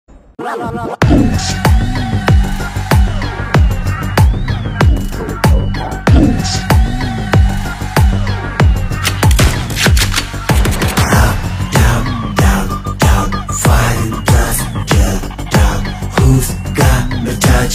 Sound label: motion poster bgm; ghost